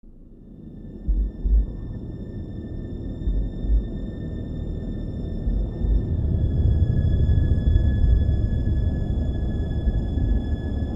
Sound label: Symphony N° 9 in D Major Adagio